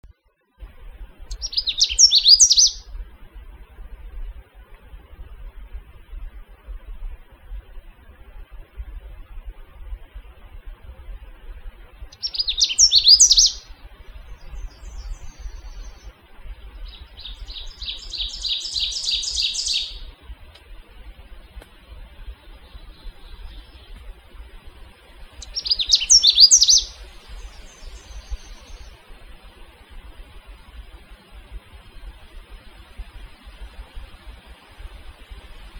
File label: Canada Warbler; Warblers